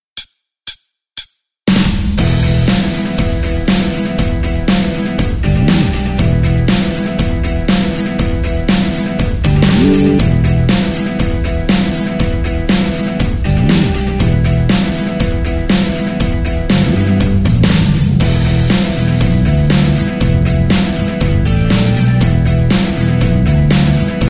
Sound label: Gnaahh, Hard Rock Guitar Backing Track